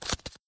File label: card dealing